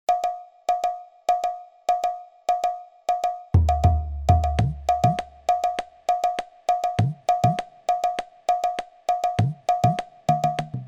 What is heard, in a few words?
khol